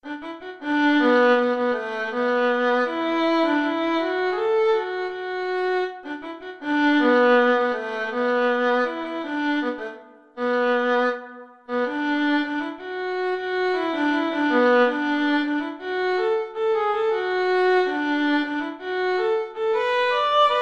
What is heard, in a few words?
Funk Study, violin